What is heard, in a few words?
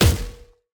enemy boss damage shield; bloom minigame